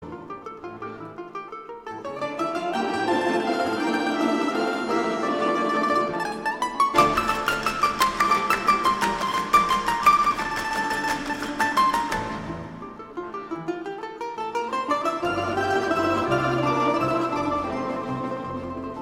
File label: Russian Orchestra